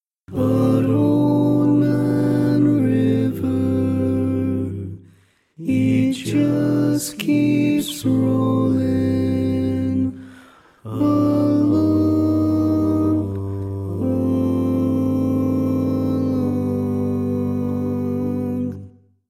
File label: Tenor, barbershop tags